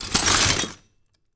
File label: Glass scrape